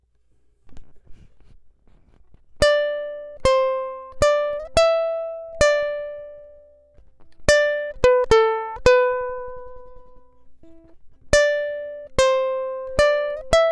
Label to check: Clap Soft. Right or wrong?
Wrong